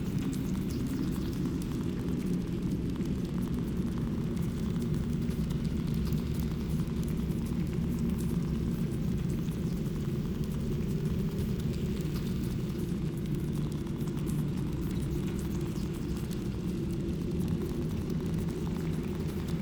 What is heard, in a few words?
amb feast